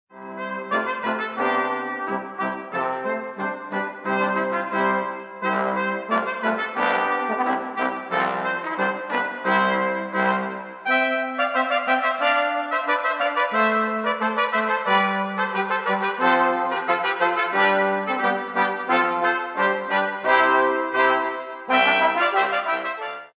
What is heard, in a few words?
Orch Holly Ivy